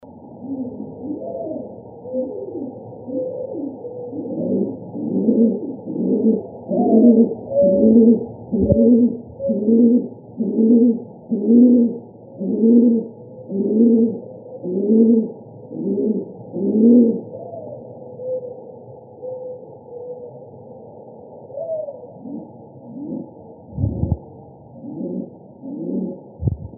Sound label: Doves; AZFO; deviche